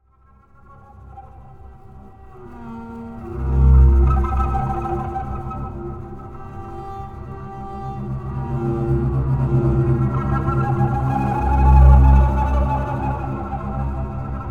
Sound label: Bass Textures; Displaced